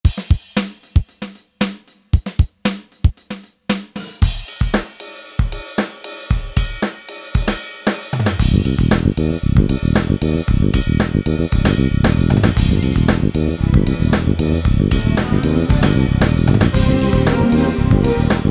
ROCK split, Generalmusic Equinox; Grooves

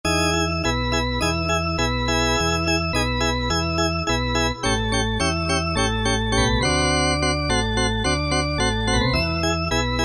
Surf Organ, Alesis QS8; Factory Preset examples

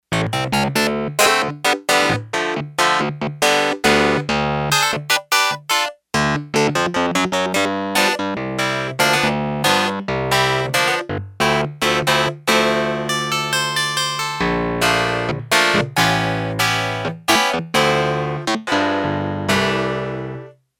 Nasal Clav, Yamaha TX802; Factory Patches; Voices

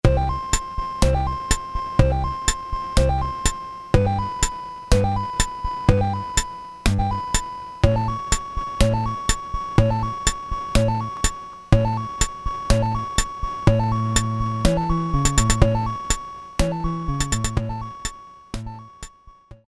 march, Casio SK-1 rhythm

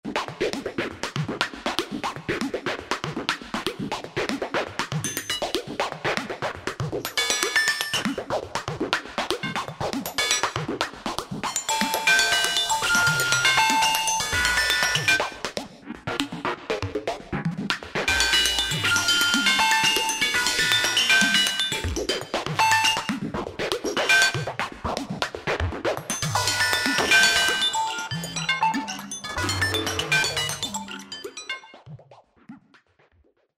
Roland V-Synth DBeam Slap; Factory Patches

